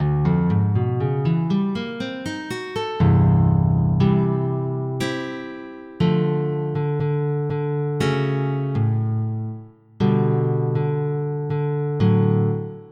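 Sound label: Nylon string Guitar; EverQuest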